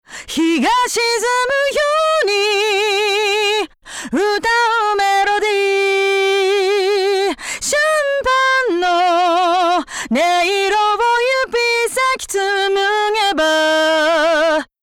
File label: Distortion Over Drive Drive Low